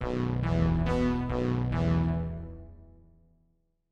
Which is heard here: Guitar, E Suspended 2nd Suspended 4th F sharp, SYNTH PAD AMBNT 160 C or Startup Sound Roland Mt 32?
Startup Sound Roland Mt 32